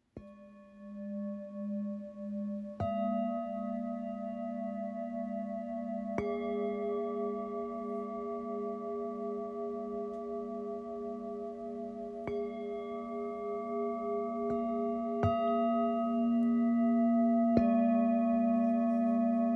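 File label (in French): Set, bols chantants tibétains; lumiere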